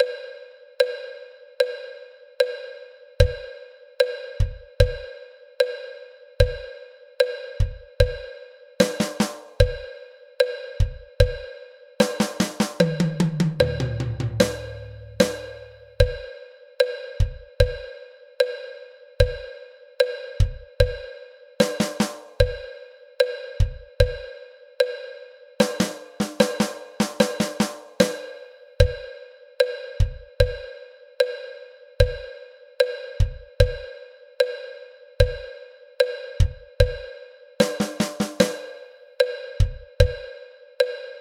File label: ROCK RHYTHM DRUM ONLY